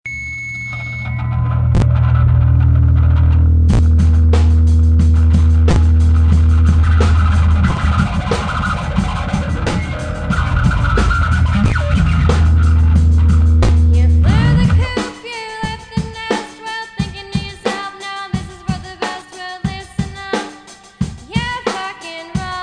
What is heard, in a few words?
birdy w vox